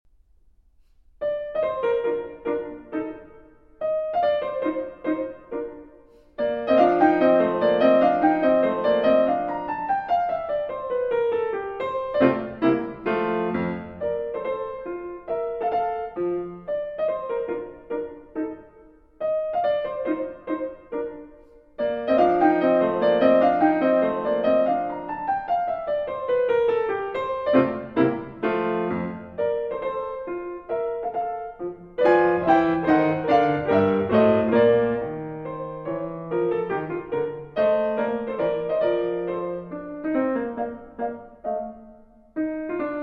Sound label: Piano Sonata in E-Flat Major Menuetto